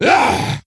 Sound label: attack, creature; Shadron